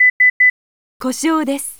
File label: wireless sensor sound; denchiya bekkan